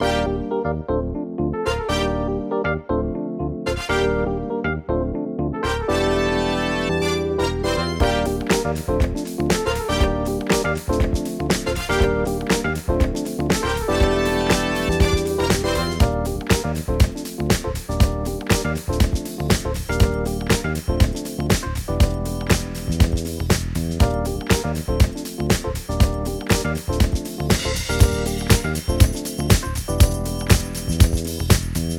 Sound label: divide ambient